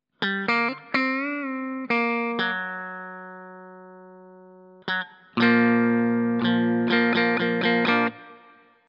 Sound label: bridge ph neck hb